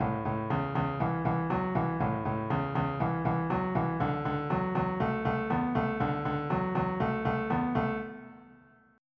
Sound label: new wave